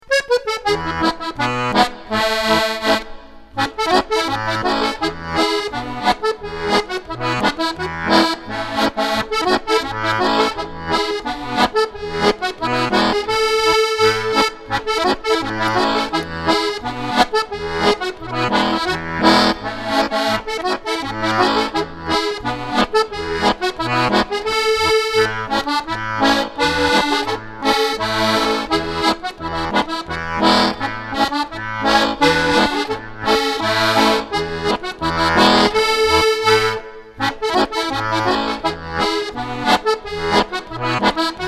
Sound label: Wiener Polka, harmonica